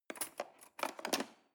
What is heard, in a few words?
cutlery cake fork drawer scrape